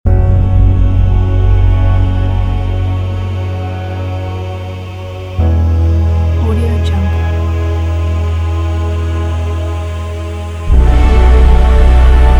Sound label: slowburn epic intro logo; marblespace